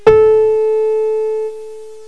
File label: PIANO N11